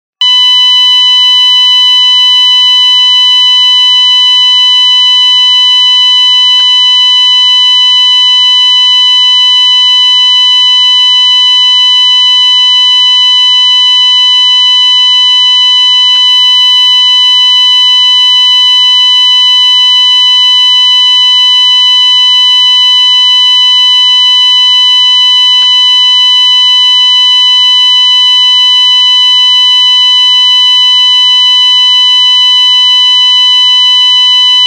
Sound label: constant 1khz, sirene; DP3000